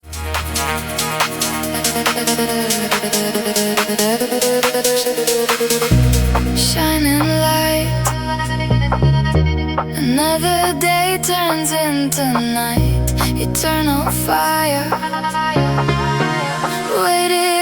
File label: Progressive House Trance